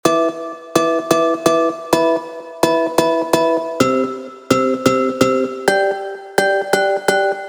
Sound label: Plucks edm Vital